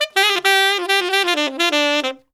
SAX SOLO; Zero-G; Phantom Horns

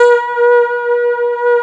ESQ 1 DIGI, Synthesizers

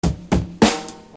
Beat, Hip-Hop Street Beats

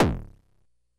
kick, Korg DS-10

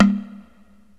AFRICAN INST; OMI - Universe of Sounds

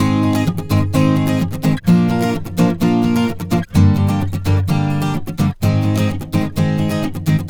Acoustic Latin Guitar 128BPM D#min; Vengeance - Essential Clubsounds Vol.4; Guitarloops

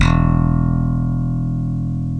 ELECTRC BASS; OMI - Universe of Sounds

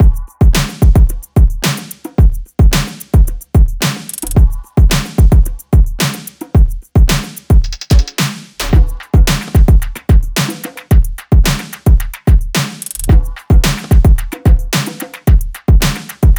loop full 110; Seismic Loops

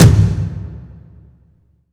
toms, cinematic impact, drum

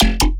Dubstep Bass

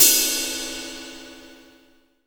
22INCH ZIL RIDE, Ride Cymbal

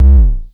KLONE BASS HIT, Klone Dual-Percussion-Synthesiser; Drum Machines

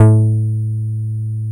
A2, BASSES

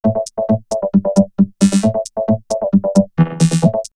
Vocoder Loop; X-Static Goldmine; VocoderLoops

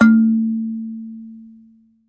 kalimba; Voice Crystal - Steve Reid Definitive Percussion

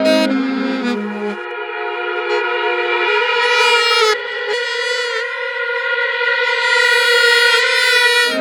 Back Sax Effect, Jazz; Sonic Foundry (Sony Creative Software); Jazz Solos and Sections; Effected Sax

